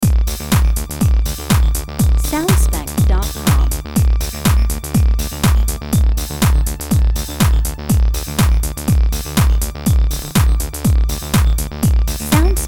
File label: House Clubbing Loop